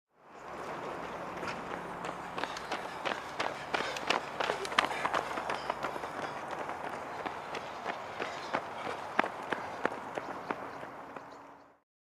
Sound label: Joggers; Runners Run By, Footsteps; Runners Run By With Labored Breaths, Light Birds.